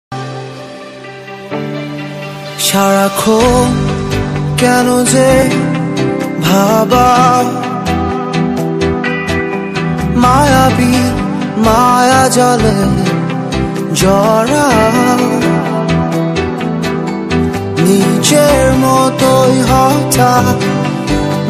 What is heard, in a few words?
romantic ringtone